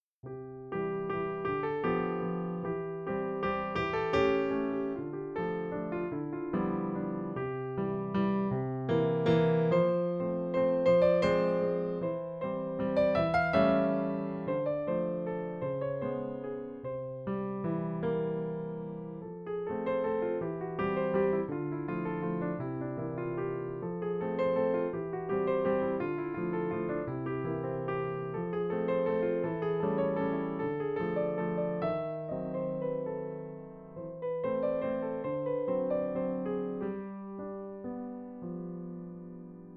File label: Spring waltz